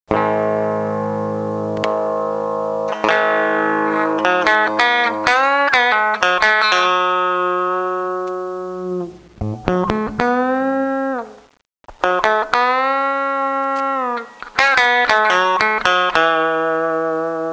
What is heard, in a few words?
treb; electra